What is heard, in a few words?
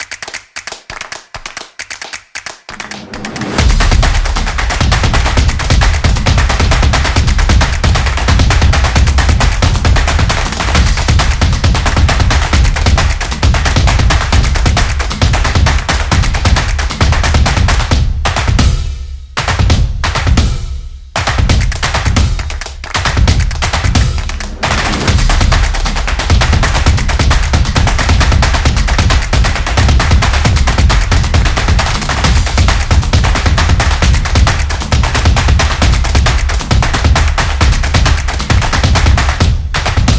The Percussion Action; football promo